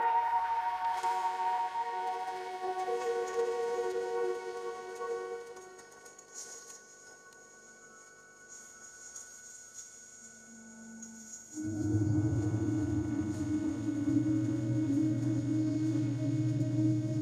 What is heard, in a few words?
ambient meditation